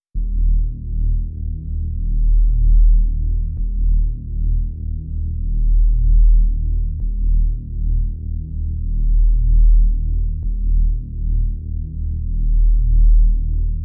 140 BPM D# Min Bass; Melodies; Loop Stems; Cymatics; 2022 Melody Mystery Expansion; Sheisty

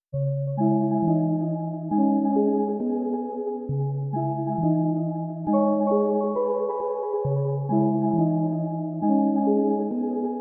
135 BPM C# Min Synth, Loop Stems; Cymatics; 2022 Melody USB Expansion; Underworld

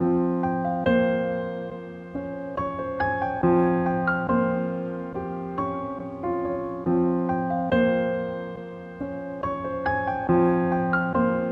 140 BPM F Min Piano, melody loop stem; Trap; Loop Stems; Trap Pt 2; Plug Talk

